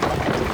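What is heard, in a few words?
dwarf axe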